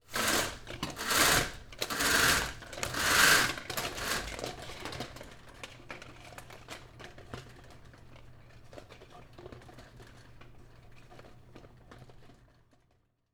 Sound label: Household Blinds Close